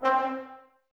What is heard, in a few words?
Brass, Woodwind Brass Ensemble